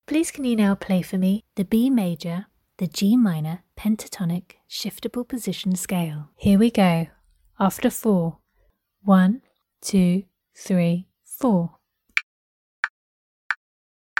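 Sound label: g minor pentatonic shift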